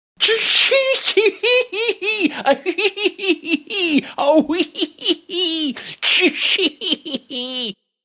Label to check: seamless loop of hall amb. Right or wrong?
Wrong